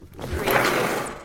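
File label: Slide Door Open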